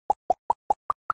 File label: pop combined